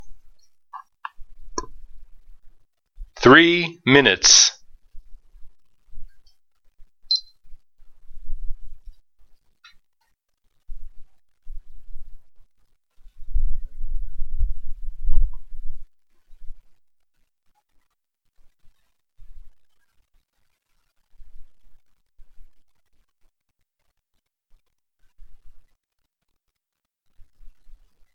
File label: 3 MIN START CLOCK